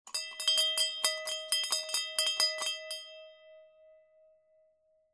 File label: BELL PULL